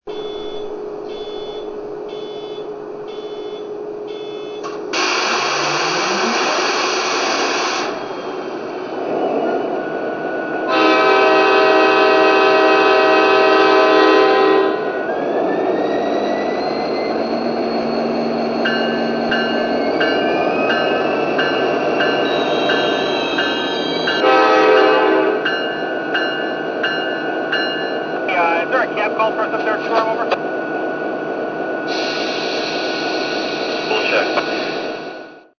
SD70ACe

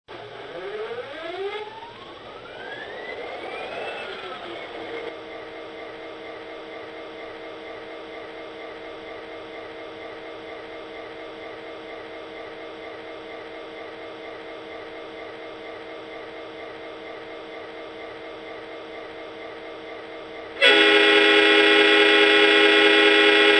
F40PH

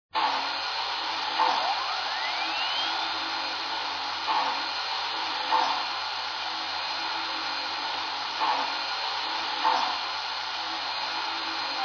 yellowstone

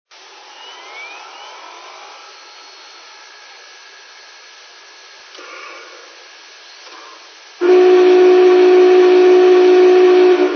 BIG BOY

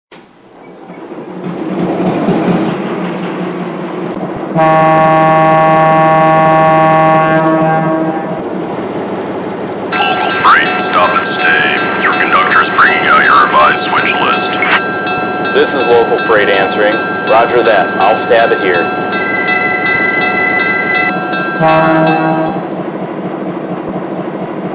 SW-1, trains

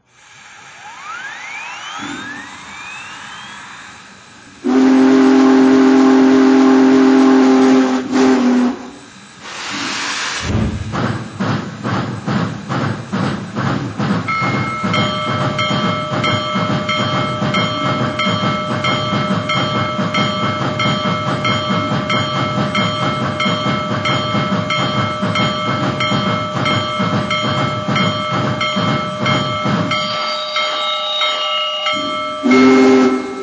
Big Boy

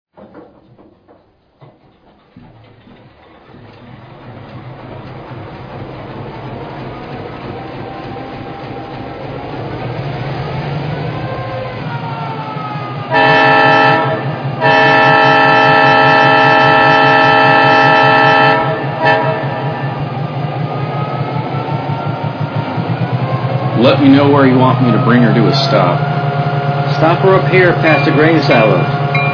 SW1200

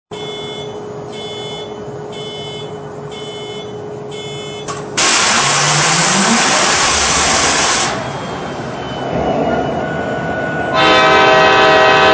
SD80MAC

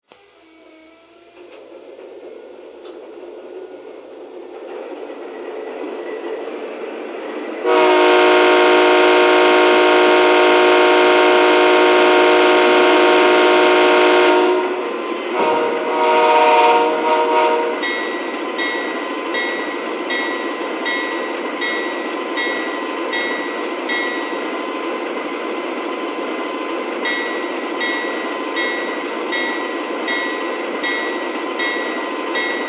ES44DC

